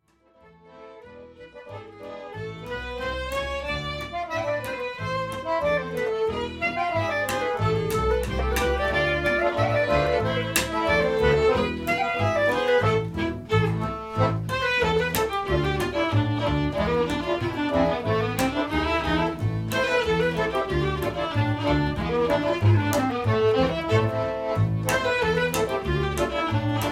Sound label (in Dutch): trompet scottish